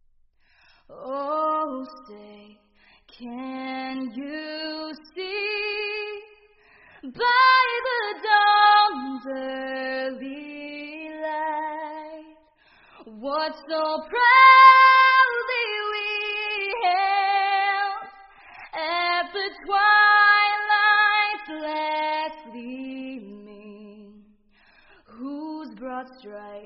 GIRL National Anthem

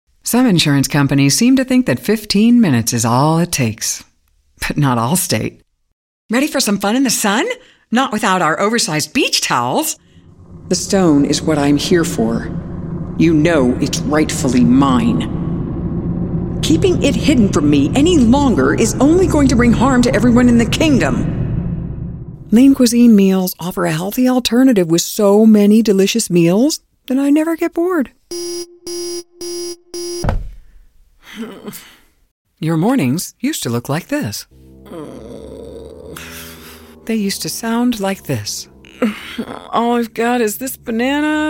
SING VO; SING VO REEL

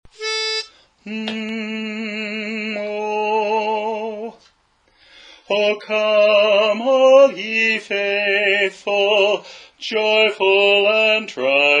Bass; O Come All Faithful

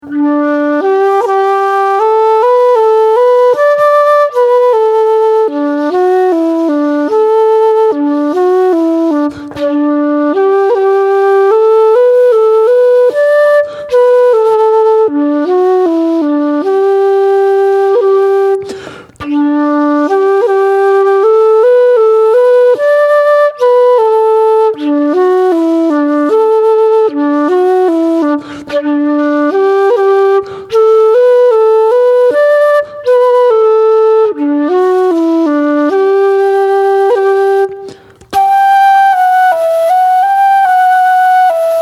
Slide Slowest; Brosna Slide

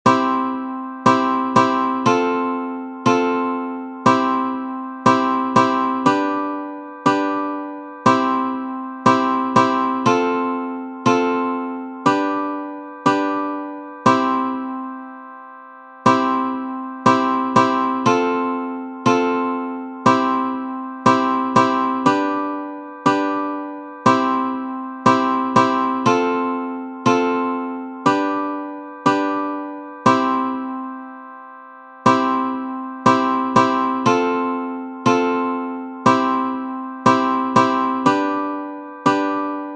Slow Strum; Shepherd s Hey

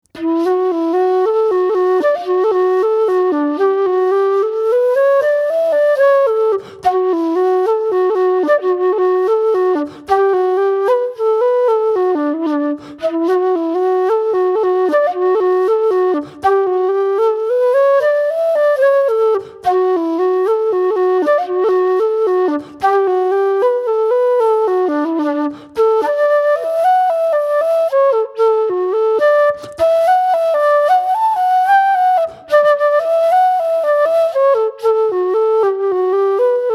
Slower; Rambling Pitchfork

